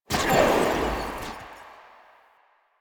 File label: Dagger Proj Loop Start; Duviri; Incarnon Adapters; Incarnon Okina Prime; Weapons